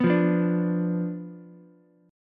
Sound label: B Diminished B down Ukulele; Bdim strummed